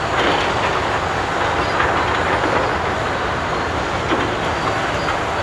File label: ambi door; Mafie 1